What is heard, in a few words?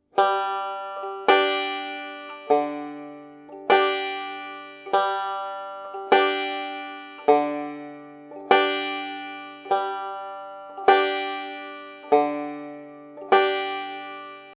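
pinch pattern, banjo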